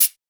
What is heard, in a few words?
Closed Hats